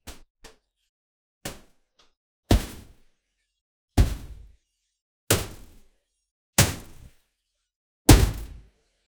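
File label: a heavy metal suit jumping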